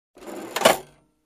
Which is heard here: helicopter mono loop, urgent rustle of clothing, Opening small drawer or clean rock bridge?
Opening small drawer